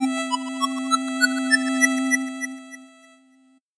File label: 8bit jingle normal